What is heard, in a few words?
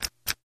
Push Button Soft, buttons